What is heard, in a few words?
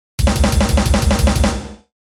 blast beat; the roots of blast beat